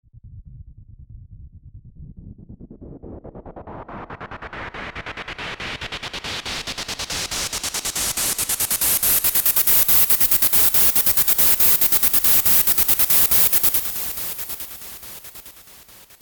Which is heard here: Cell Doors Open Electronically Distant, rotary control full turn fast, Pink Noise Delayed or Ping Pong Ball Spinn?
Pink Noise Delayed